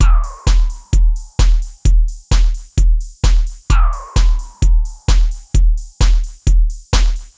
130 Neo Wave Drum, Pop Rock, Drums, Very Fast Tempo; Pro Tools Essential Loops; Digidesign